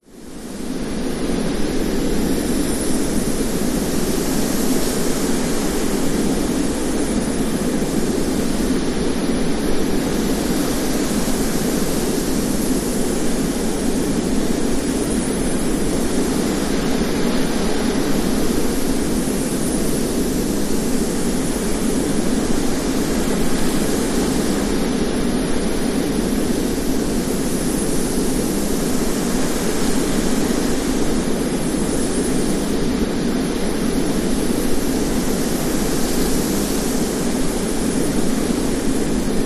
science fiction wind blustery planet weird subtle insect chirps